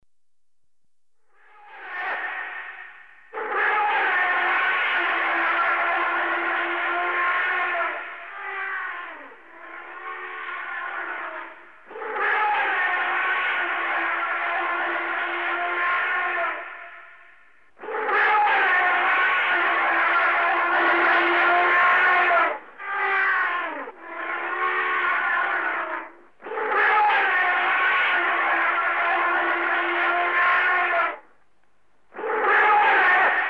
Elephants close up, others in background. In captivity, some slight echo from walls; The BBC Sound Effects Library; Africa- The Natural World